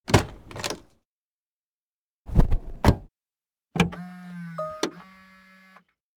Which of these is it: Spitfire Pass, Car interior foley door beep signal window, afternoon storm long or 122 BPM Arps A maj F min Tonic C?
Car interior foley door beep signal window